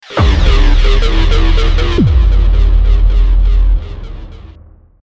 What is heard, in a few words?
multi kill